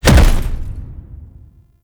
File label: Tenno Land Redux; Warframe; Cinematics; Lotus Intro